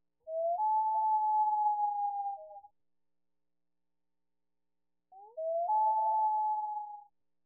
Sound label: Loon 1000hz lowpass; pitch tracer